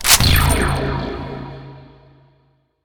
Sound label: railgun draw, weapons; svencoop; rngremake